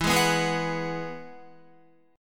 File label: E Major Add 9th, Guitar, down; strummed; JGuitar